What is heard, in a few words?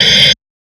Hats, Alesis Performance Pad